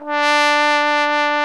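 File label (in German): POSAUNE VIBRATO; Best Service; Alpine Volksmusik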